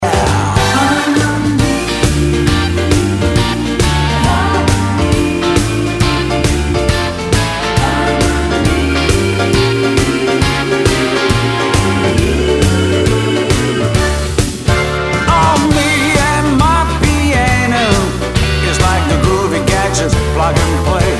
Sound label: Me and my piano